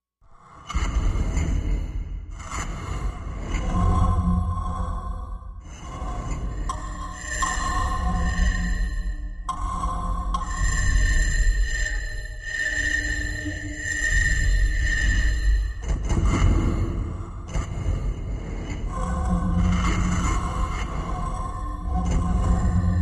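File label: Breath Apparatus Machine; horror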